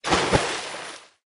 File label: Removable Splash